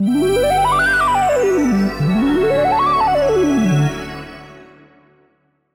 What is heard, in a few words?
Morning Alarm